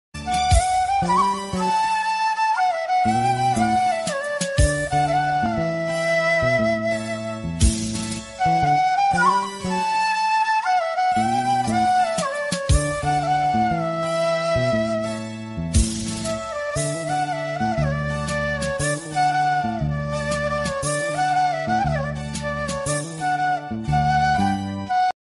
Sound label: cover song instrumental ringtone flute ringtone whistle ringtone